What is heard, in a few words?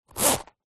purse pouch leather zip; zapsplat; foley